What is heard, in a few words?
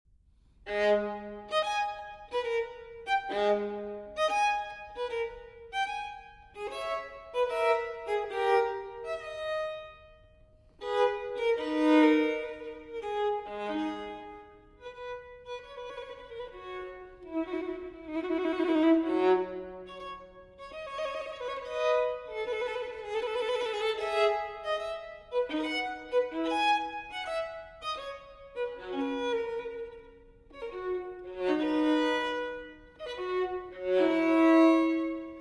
Fantasia For Solo Violin in A Minor, Moderato; No. 12; TWV 4025; Telemann Fantasia